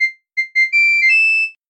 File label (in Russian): zvuki s effektom zaversheniia, okonchaniia